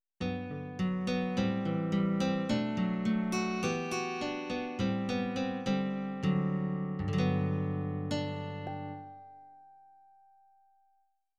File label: Dynamic Nylon Guitar